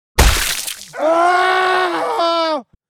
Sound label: scream